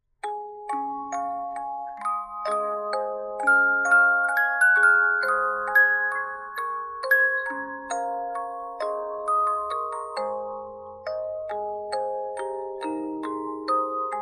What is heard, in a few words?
Minuet Minuet, musicbox